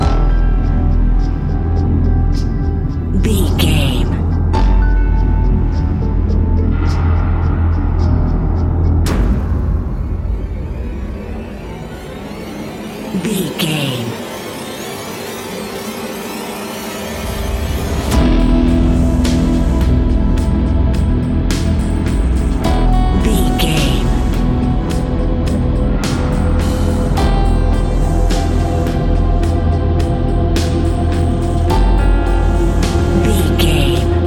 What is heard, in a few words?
horror haunting whispers